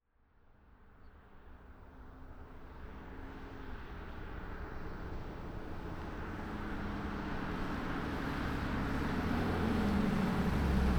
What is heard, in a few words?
BMW F12 640D Gran Coupe exterior wet asphalt stop engine off on and drive away following; mono 8040; Soundholder